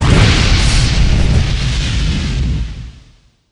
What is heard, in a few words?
fire; doom3; archvile